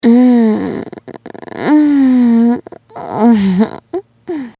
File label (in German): stoehnen